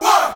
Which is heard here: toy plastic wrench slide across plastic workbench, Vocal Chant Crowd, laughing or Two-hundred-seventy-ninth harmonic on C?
Vocal Chant Crowd